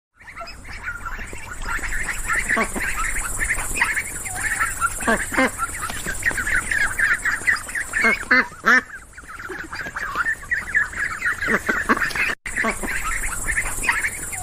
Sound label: Duck Sound